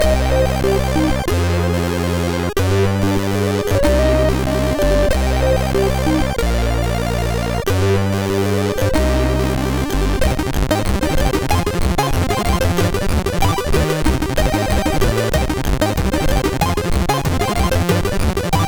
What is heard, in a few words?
Bass Themes, sid MOS6581R3